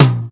drumset, drums; SKY; IM-A730S VEGA S

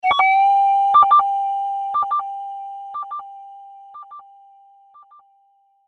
call mail; HTC; Infobar-A02

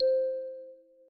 Class Electronic, notifications; OnePlus OxygenOS 13; dynamic notifications

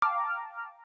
Orchestral close

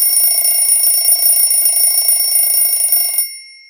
Beep-Beep-Beep Alarm; Itel Vision 1; alarms

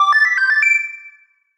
notice; freetel

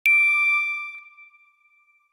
Relaxed Light Chime; BlackBerry OS-4.6

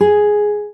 Classic Guitar

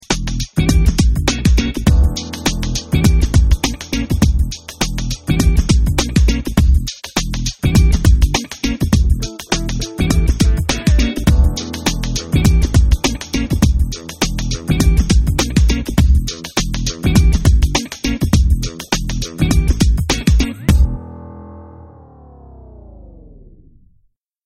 lounge; Motorola PEBL U9

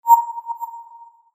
Boolean, notifications; HTC One M9

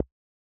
Keypress Delete; HMD Pulse

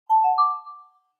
Sprout, notifications; LG Swift L9

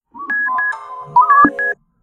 Message

